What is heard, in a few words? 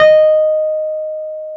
PNO, Wurly